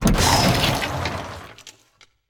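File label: ambience dirt in; Blind Drive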